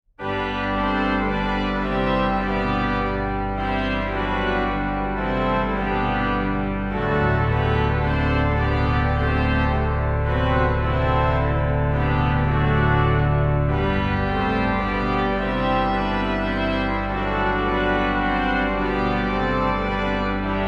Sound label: orgelpunkt g dur, organist